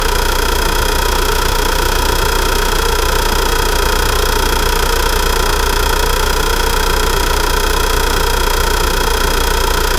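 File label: engine running, race car; benetton 1990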